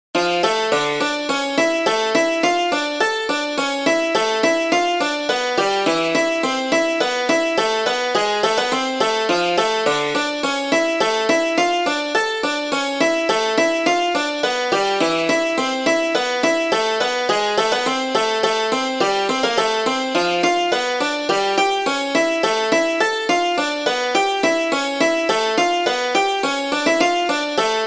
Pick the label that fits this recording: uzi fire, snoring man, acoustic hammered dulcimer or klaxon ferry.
acoustic hammered dulcimer